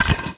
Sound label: flame in, weapons